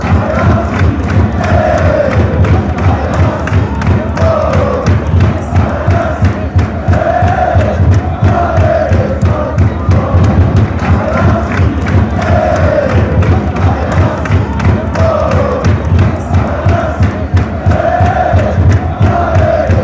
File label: allez, fanchants; racing